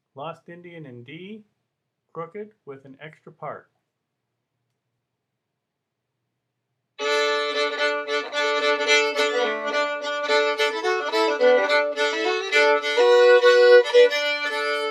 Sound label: D, fiddle; Lost Indian; festivaltunes